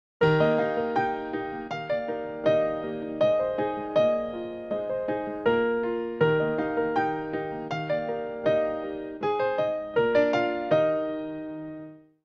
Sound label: Curiosity Piano, alarms; Coolpad 1827